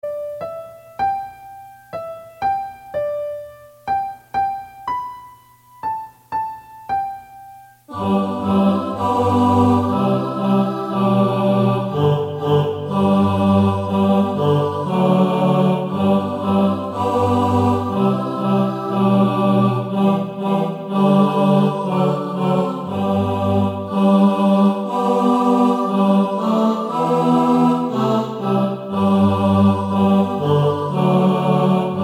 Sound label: TENOR